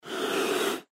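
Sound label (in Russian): pantera shypit, panther hisses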